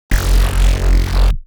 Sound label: Neuro Bass